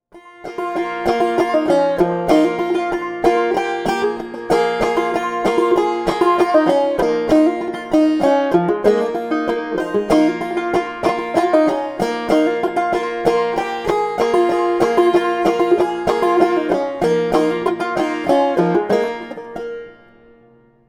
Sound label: banjo